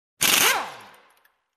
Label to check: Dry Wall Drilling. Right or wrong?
Right